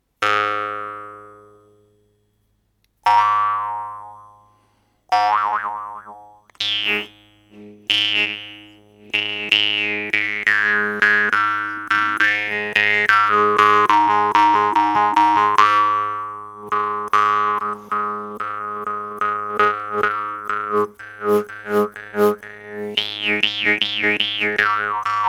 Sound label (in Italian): violino; guimbardes